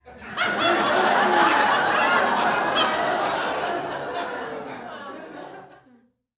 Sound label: Short Laughter